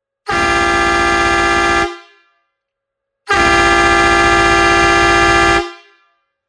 klakson 12v 24v chromova 2 tonova fanfara truck

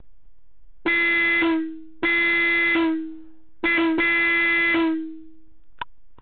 klakson 12v 320hz 110db diskovy (130mm)

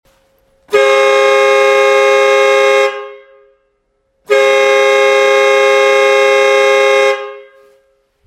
klaksón 24v podtlakové 2 tónové fanfáry s kompresorom 295mm

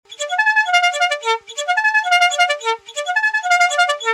fanfáry 12V music call to the post; stebel mp4

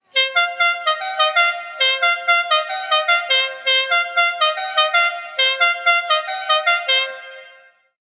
fanfára 4 tónová 12V music; stebel mp4

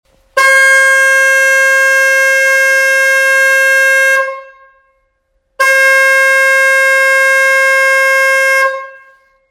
elektromagneticka fanfara 24v vysoky ton chrom 260mm; duha

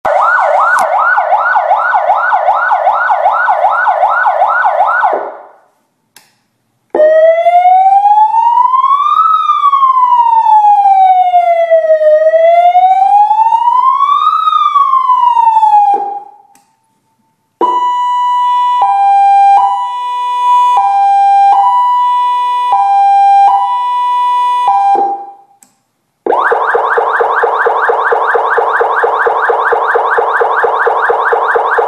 výstražný systém 12V 100W 7 tónov a mikrofón; spinanie dvoch svetelnych zdrojov

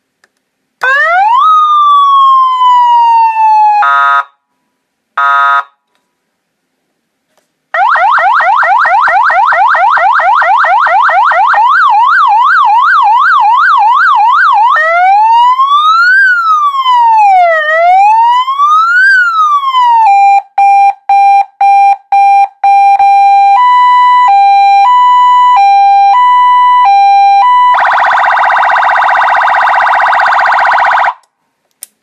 výstražný systém 12V 100W 8 tónov a mikrofón

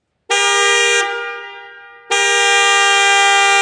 klaksón 12V slimákový chróm; stebel tm80 2 magnum

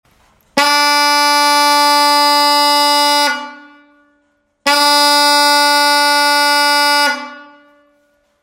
fanfara 12v elektromagneticka 560mm seda

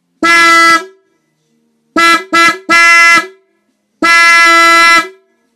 klakson 12v elektromagneticka singel fanfara (490mm)

